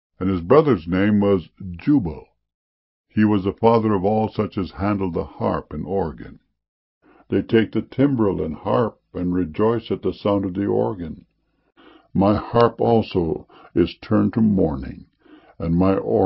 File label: organ